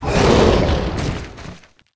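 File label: Wooden Beast death; Swamp Of Darkness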